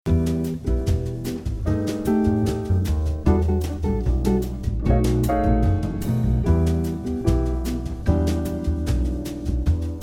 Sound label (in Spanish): Musica Jazz Instrumental